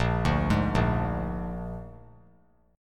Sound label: Bb 7th Bb down 3C,3F,3A,3D; arpeggiated; JGuitar